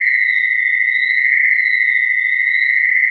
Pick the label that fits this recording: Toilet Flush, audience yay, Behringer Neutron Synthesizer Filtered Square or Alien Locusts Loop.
Alien Locusts Loop